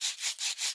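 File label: Special Photo Crayon Environment Draw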